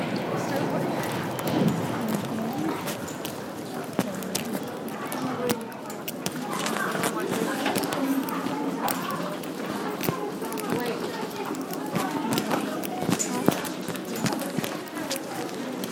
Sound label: london underground stations and trains soundscape